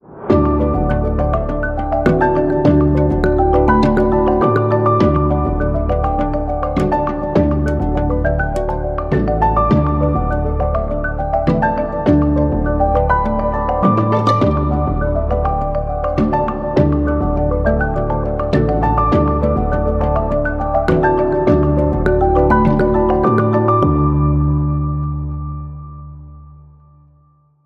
No Perc

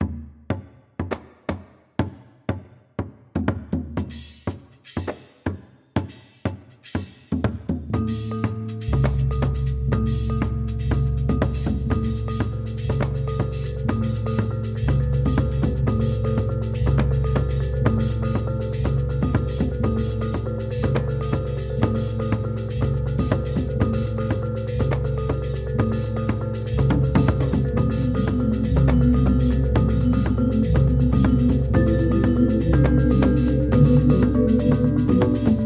Minimal Gamelan

